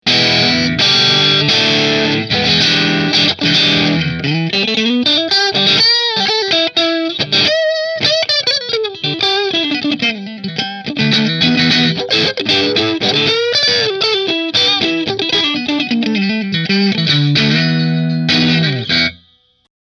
Rahan Double Maple Position 2 Marshall, guitars; Rahan Guitars RP Double Cut Figured Maple

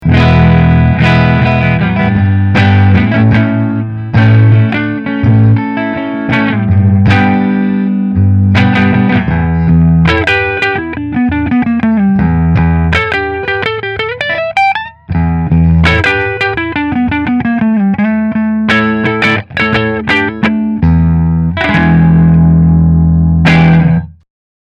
Hamer cherry Monaco Neck Fender

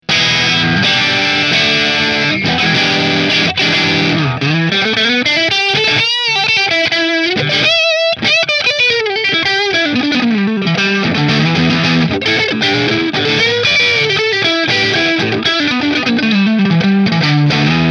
Hamer Custom Middle Marshall

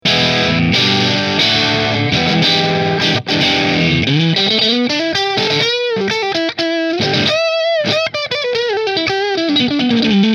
Nola Model 8 Sunburst Middle Middle Marshall; New Orleans Guitars Model-8 Sunburst

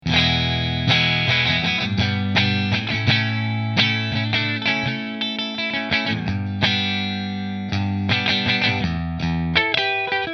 Godin Middle Fender, guitars; Godin LGXT Trans Blue

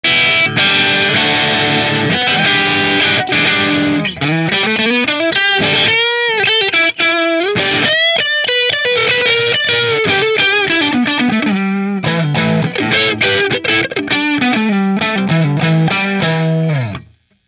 Nola Voodoo dark red hum neck marshall; New Orleans Guitars Voodoo Custom Redwood Top

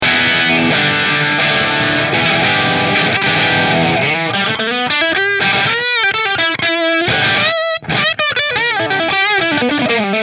Bridge Marshall; Masters Guitars LPM Pro Cherry Sunburst

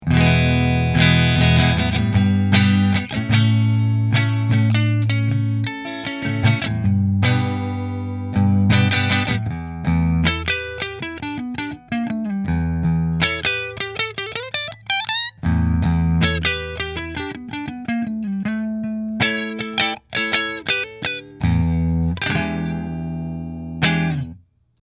Nola Model 8 Neck Split Fender, guitars; New Orleans Guitars Model-8 Natural Finish

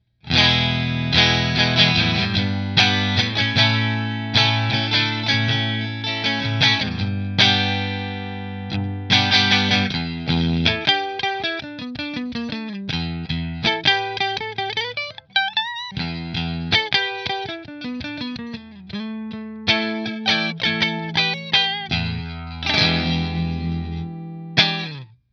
Tokai Strat Position 3 Fender, guitars

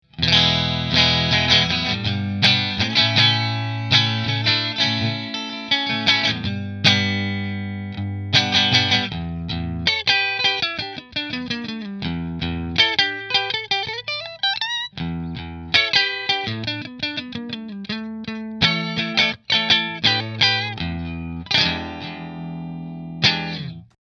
Partscaster Position 3 Fender, guitars; Fender Partscaster Stratocaster Custom Relic

